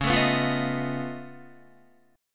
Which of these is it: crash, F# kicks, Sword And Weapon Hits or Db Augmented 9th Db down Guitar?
Db Augmented 9th Db down Guitar